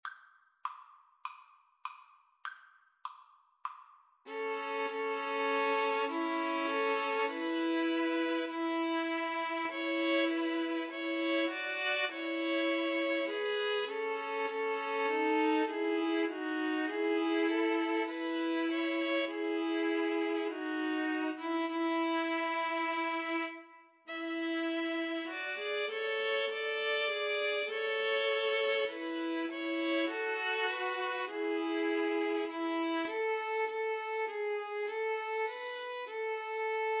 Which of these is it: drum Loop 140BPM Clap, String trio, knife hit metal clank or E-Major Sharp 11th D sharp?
String trio